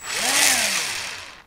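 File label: drill pre attack; drill men pack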